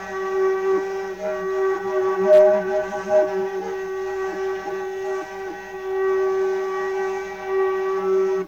XINGU, ETHNIC; AMG; Global Trance Mission VOL-2

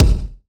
80s Drum Kick; Yamaha PSR E305

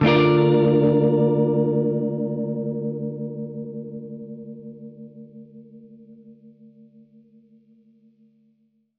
Gretsch Chord Dminb6, Guitar Chords

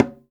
GENDANG D6, GENDANG DRUM; Spectrasonics; Heart of Asia

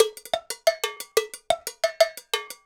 Cow Bell, Latin Percussion; Sonic Foundry (Sony Creative Software); Joe Vitale Latin Percussion

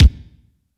KICK, AMBIENCE KICK

